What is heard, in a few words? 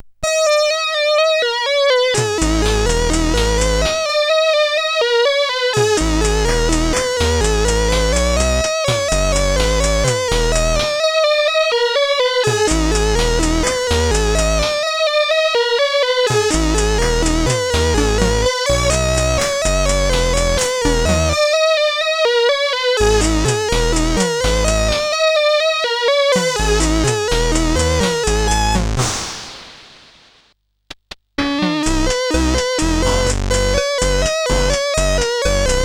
sid 8580; Pams Tune